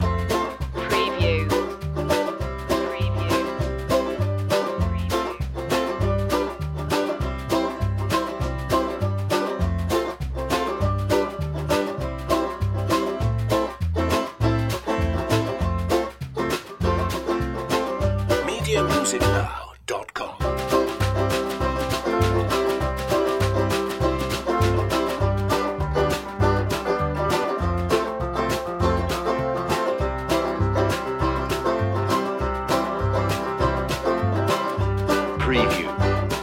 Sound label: happy western loop